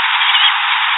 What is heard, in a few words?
plover